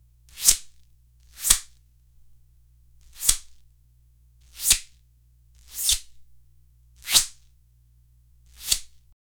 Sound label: rope unravel uncoiling; cloth